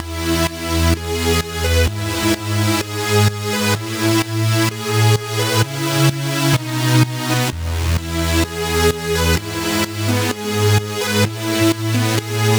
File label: synth chords, Key A Bpm 128; Loop Nerds; Big Room House Allstarz